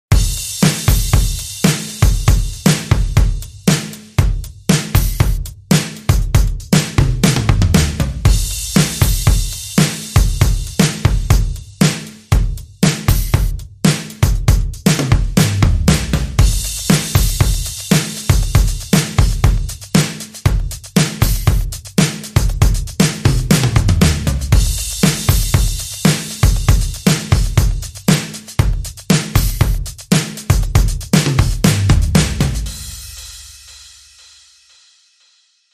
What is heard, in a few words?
Beat